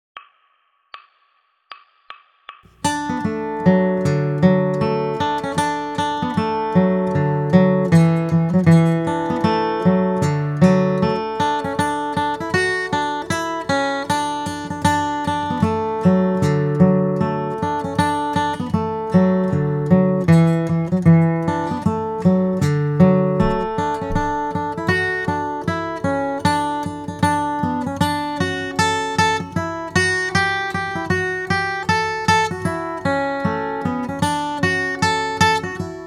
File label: Bluegrass Guitar, easy fiddle tune played out of C